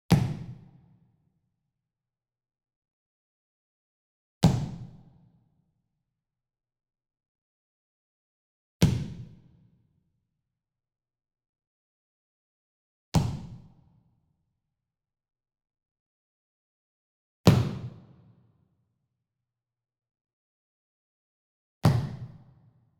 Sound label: Sweetener Foot Stomp Medium; boom library; Cinematic Strikes Construction Kit